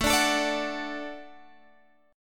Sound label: F sharp Minor Sharp 5th